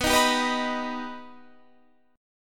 B Augmented B Guitar Standard, chord; strummed; JGuitar

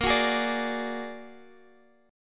A sharp Augmented 7th, Guitar DADGAD; 20,21,18,19,x,x

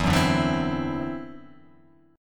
E 7th Suspended 2nd Sharp 5th, E, down

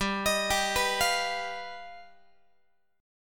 G Augmented 7th, Guitar; arpeggiated

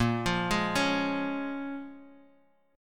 Bb Minor 7th Bb, Guitar, Open G; x,3,3,1,2,x

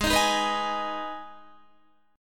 G sharp Minor G sharp down Guitar, chord; G#m strummed

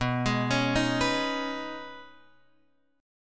B 6th Add 9th B down Guitar Open F; arpeggiated

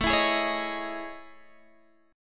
A Major Sharp 11th, Guitar, Standard; AM#11; strummed; JGuitar

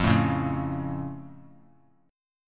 F Augmented F; strummed

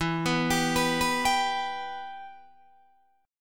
E-Minor, Guitar, Open D

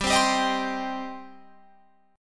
G Suspended 4th Sharp 5th, Guitar Open F; Gsus4#5 strummed

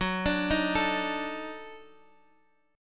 Eb Minor Major 7th Flat 5th Gb

